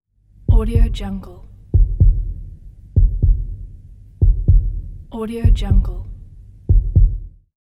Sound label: heartbeat